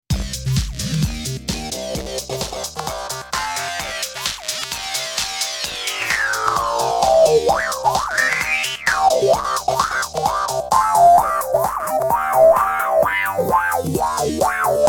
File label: Restyler Bandpass